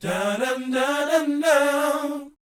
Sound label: BOYZ, Vocal, R&B Dance